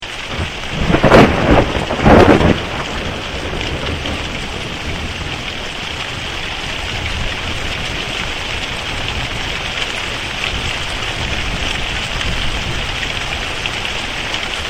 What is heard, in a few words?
thunder crack